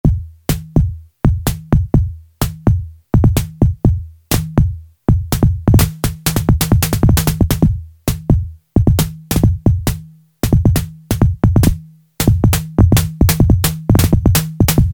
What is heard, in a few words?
B.DRM-SNAR, Yamaha DX7